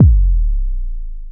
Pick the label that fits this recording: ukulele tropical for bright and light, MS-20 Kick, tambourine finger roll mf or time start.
MS-20 Kick